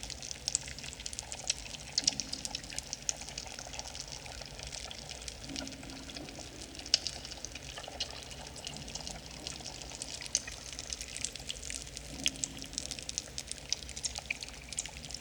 cave; Golden Crayon Sounds

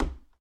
wood step; Original TK Sounds

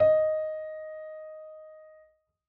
piano d-1#; Golden Crayon Sounds

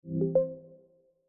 home announcement received; iOS 26 Sounds

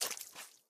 mud step; Golden Crayon Sounds

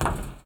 roof step; Golden Crayon Sounds

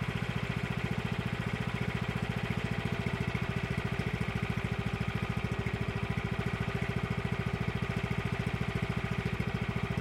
generator; Golden Crayon Sounds

